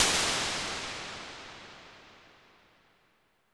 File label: Big Plate, Impulse Responses, Plate Reverbs; Large Spaces; Apple